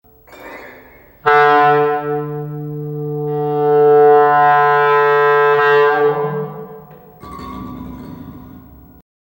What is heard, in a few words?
Clarinet